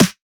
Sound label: Snare, Dance Drum Kit; 99Sounds x Monosounds; Twilight